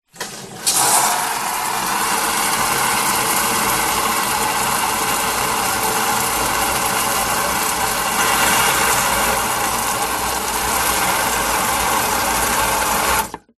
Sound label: industrial cannister large plastic fill with water from hose